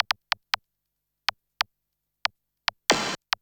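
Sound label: SNARE ELECTRO 140, Techno Drum; Techno2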